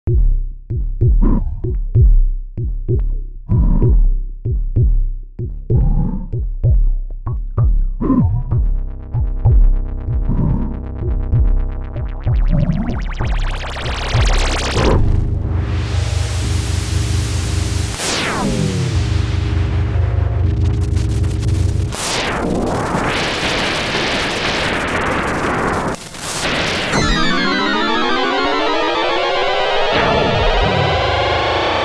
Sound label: effect sounds